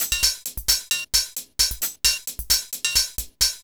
HiHat Perc Loop, Dance Beats

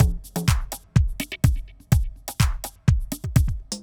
grass kirt 125

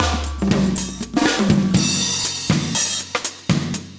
chique 120, loops, 120bpm; Sound Effect Library

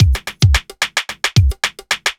block rock kit

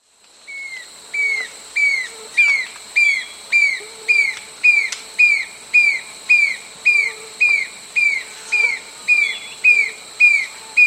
Ihering's Antwren; S. America

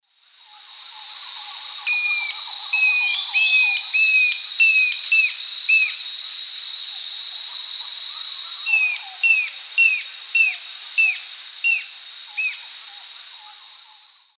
Galbula albirostris, call; S. America; Eastern Lowlands

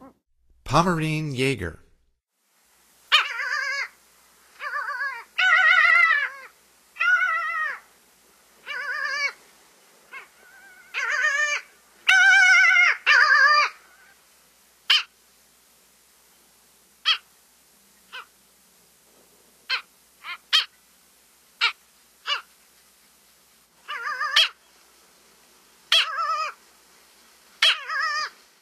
Pomarine Jaeger; North America; Stokes

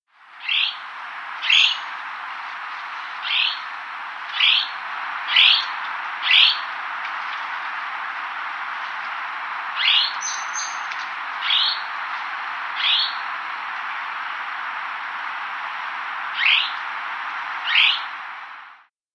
Slaty Gnateater, S. America

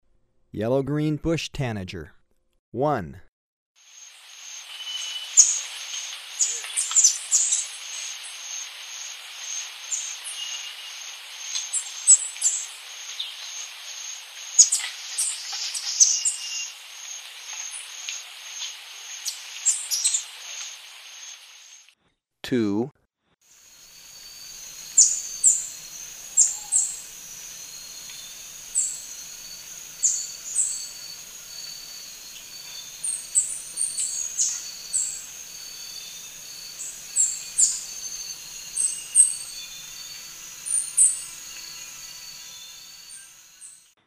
Yellow-green Bush-Tanager, Birds of Ecuador; S. America

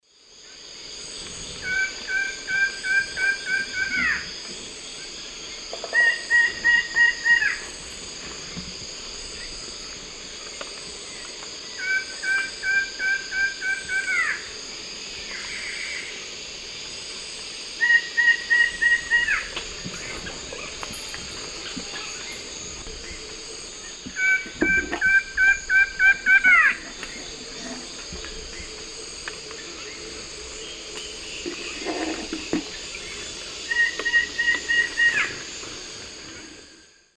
Thamnophilus schistaceus, duet; S. America; Eastern Lowlands

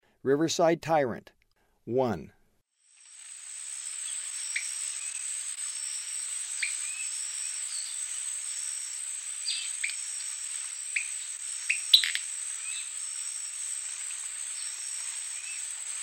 Riverside Tyrant; S. America; Eastern Lowlands

